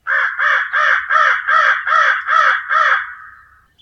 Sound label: Crow